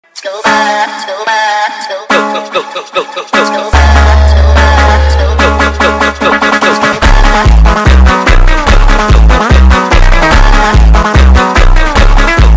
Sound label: Funk sped Up